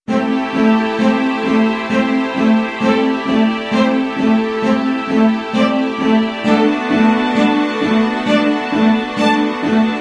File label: strings